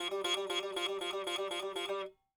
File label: sitar